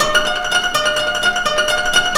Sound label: KORA; Zero G - Ethnic